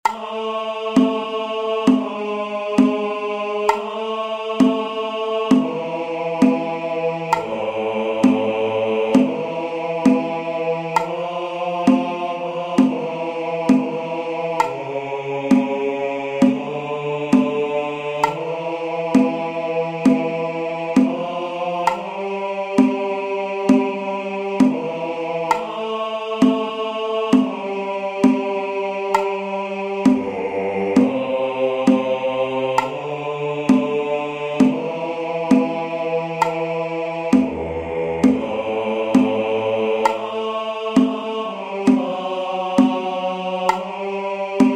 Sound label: Bass; Jesu Dulcis Memoria